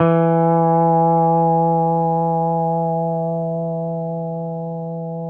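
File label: pedal steel E3 right; PEDSTL NOTES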